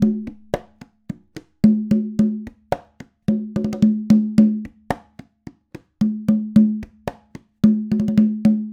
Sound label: latin conga loop, 110; perc construction loops; SEISMIC Drum Sample Pack; Slate